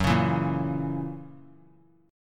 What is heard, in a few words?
F sharp 7th, down; strummed; JGuitar